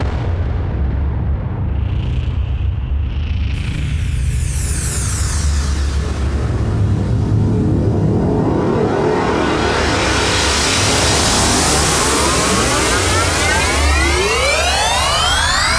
Impacts, Effects

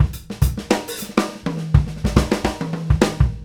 FILL

